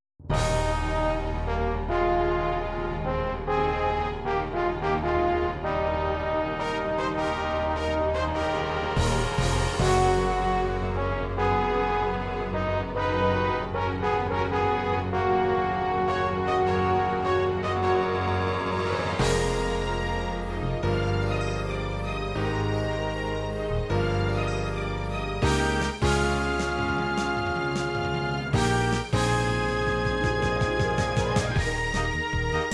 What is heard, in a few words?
anime chacha